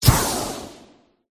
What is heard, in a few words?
mage student attack contact middle